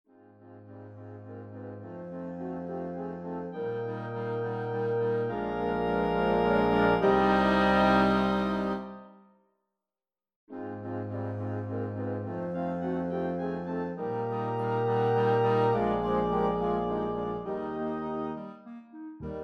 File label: midi; Cambiale